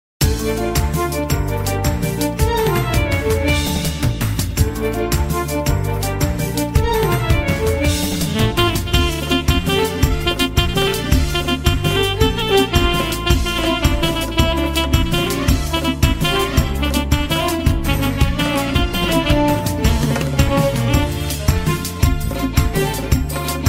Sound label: Guitar